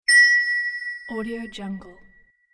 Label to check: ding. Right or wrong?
Right